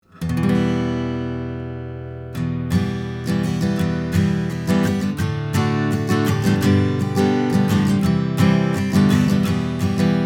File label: Acoustic Git Strumming; Zoom F8; Beyer 201